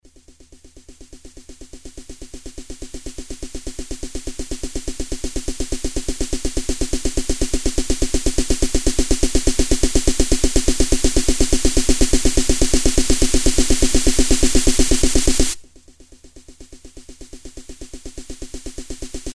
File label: House Snare Roll, Roland MC-303